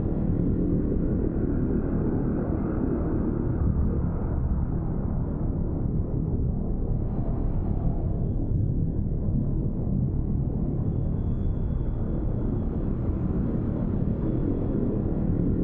ship habitat idle; Stellaris; machineage

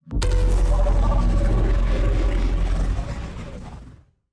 psionic robot; Stellaris; shroud

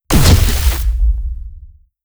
dragon wing attack; Stellaris; guardians

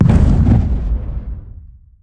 station explosion small; weapons; Stellaris

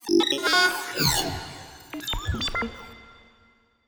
portrait machine; Stellaris; toxoids; portraits

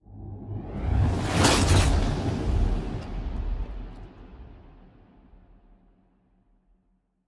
ship construction ship move

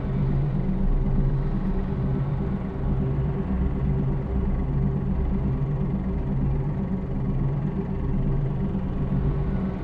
ship pre-FTL combustion idle; Stellaris; first contact; ships

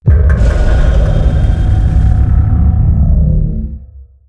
spy orb build upgrade; Stellaris; utopia

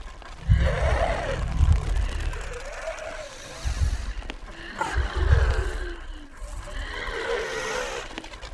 dimensional horror idle; Stellaris; guardians

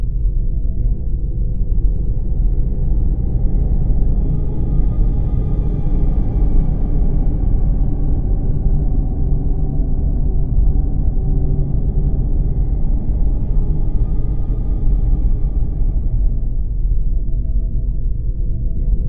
juggernaut fungoid idle; Stellaris; federations

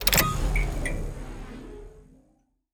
ui holograph unlocked; Stellaris; paragon

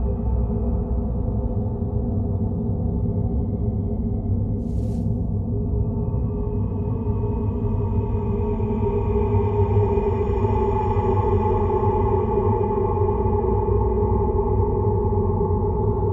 amb celestial storm; Stellaris; cosmic storms

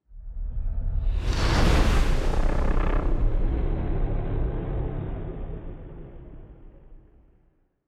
ship colossus move; Stellaris; toxoids; ships

